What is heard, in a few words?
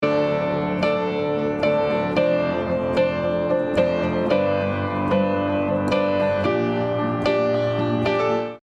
Rock Chords DRY